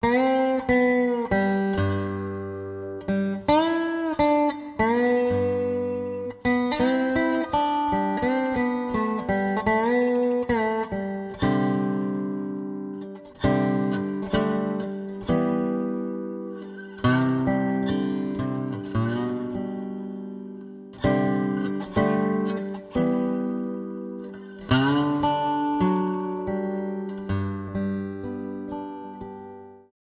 Solo Dobro Ballad

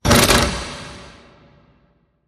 Door Lock

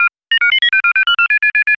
Quick Dialling Beeps

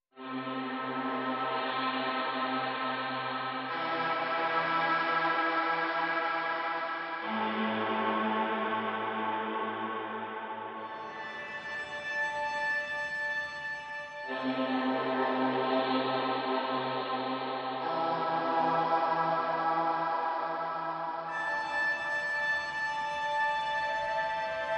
Strings and Pad Mix

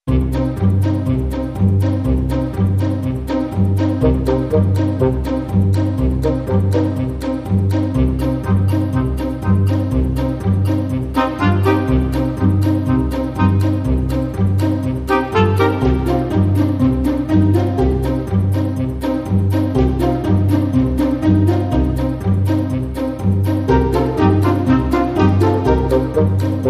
Traditional Percussion No Woodwind Leads

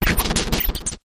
Hard Static

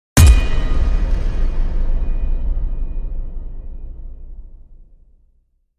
Downfall Hit

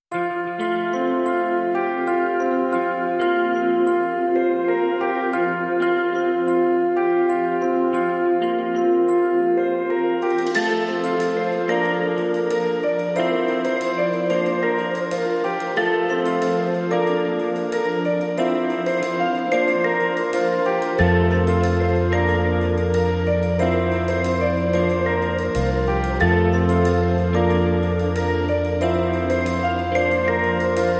Bells and Guitars

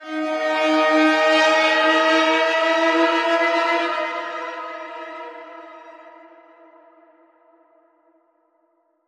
Warning Bend

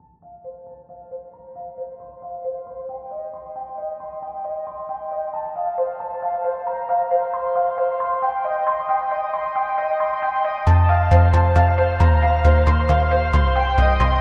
Mid Part Bass No Drums; Bizet LArlesienne Remix

